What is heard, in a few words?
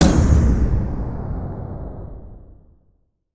teams leave team; aronwk collection; ndaudio